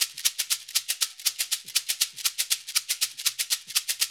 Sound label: LOOP, Percussion